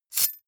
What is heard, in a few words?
knife slash